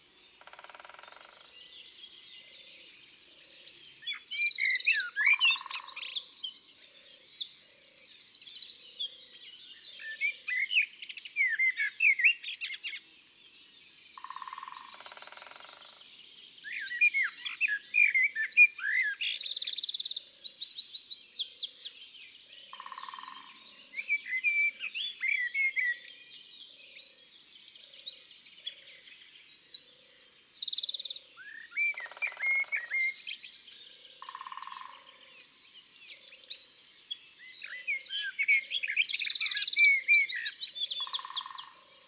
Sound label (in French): Pic epeiche, Chants d'oiseaux des bois et des forets